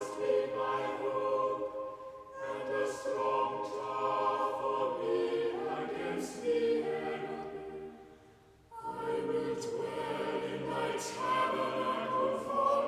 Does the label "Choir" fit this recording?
Yes